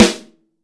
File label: large room snare drum sound E key, acoustic snare tuned to the E note